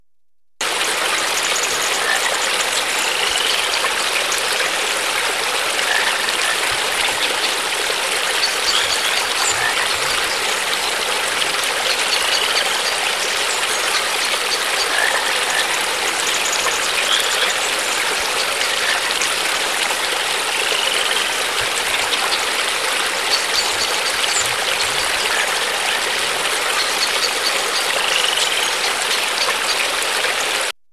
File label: river country animals